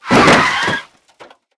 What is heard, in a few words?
drift